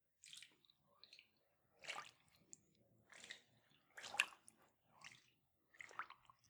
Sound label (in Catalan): aigua